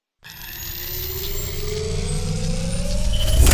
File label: charge, weapons battery